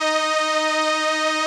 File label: SOLINA, String Machines